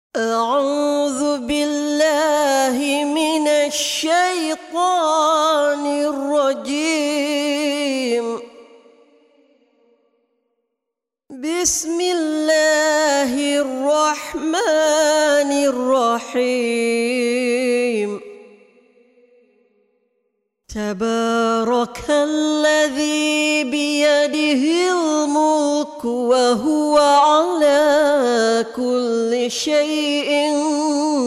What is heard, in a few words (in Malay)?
Surah Al Mulk (ayat 1-2); Quran